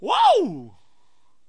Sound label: whaouu, Voice